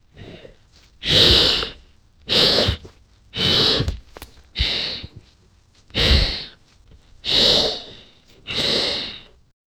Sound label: giant creature with snake